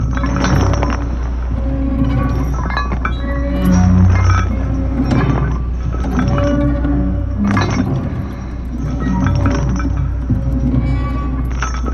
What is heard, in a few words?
chains wind strong